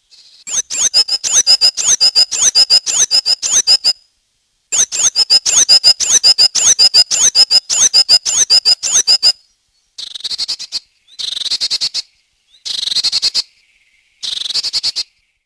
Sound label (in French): mesange bleu